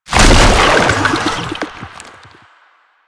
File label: Infested Roll End; Warframe